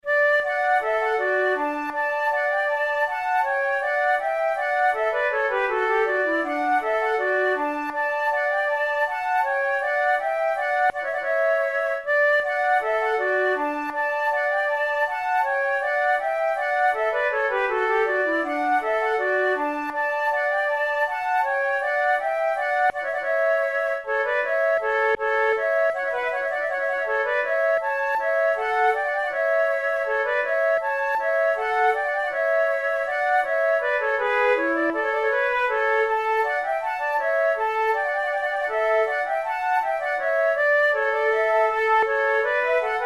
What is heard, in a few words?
boismortier op 22 no6 les bourgeois du roule, flute